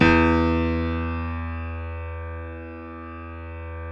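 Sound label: E1 piano right; Northstar - New Gold VOL-2; BOS.GRAND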